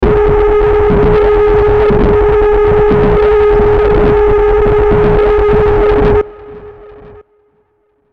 Eerie Filter Warble Feedback Delay; Warped Sound Effects

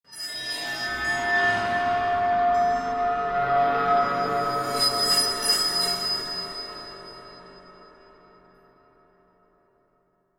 Sci Fi Swell Digital Bleeps Metallic Eerie; Sci Fi Synth Swell Digital Blips Eerie

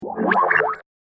Electronic Scanner, control panel; science fiction sound effect; Andrew Fleming

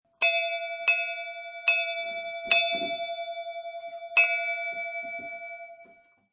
Bell Ring Multi; Bell Ring Multiple 1; Bell ringing; Bells; Musical

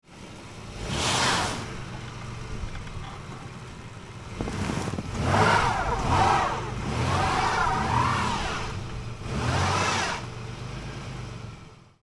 Car Spins Wheels Snow, Car Spins Wheels In Snow; Car Wheel Spins Several Times In Snow; Vehicles

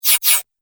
Double Fast Whoosh; Whoosh Sound Effects

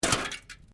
Can Softdrink Rubbish; various metal cans; Metal (Sound Effects:Materials)

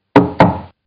DOOR KNOCK; Doors General; Door Knocking; Sound Effects:Doors; Partners In Rhyme

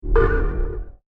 Repair Robot; Robot Sound Effects; science fiction sound effect

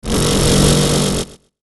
Electrical Swell Bright Delay Buzz; Swells (Sound Effects:Sound Design)

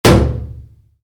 Wood hit metal heavy, Wood, Impact: Metal, Heavy; Materials; Wood Sound Effects

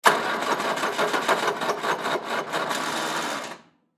Forklift Start Stall; Forklift Start Up Rumble Stall; Machinery; Blastwave

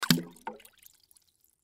Water Splash Submerge Toy Bathtub; Splash Sound Effects; Splashes; Objects; Blastwave

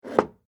Household Hairspray Remove From Cupboard; Bathroom (Sound Effects:Household)

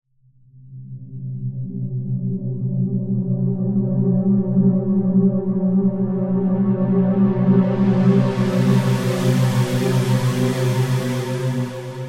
Synth, Stab, Mysterious; Stabs; BlastWave

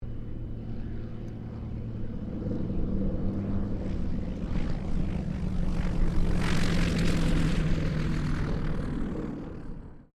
AIRCRAFT CESSNA 195 LAND; Airplanes

